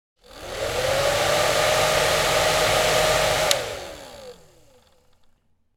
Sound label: Hair dryer running medium; Household Appliances